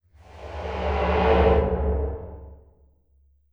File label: slow striking